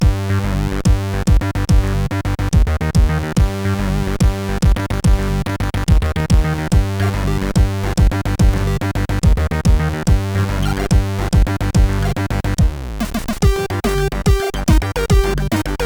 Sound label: Upbeat, CSG8580R5